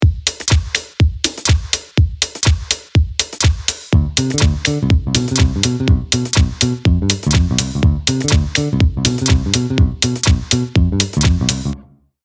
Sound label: Straight Beat bass